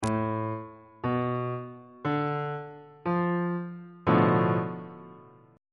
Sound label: c double sharp minor 6th 2nd inversion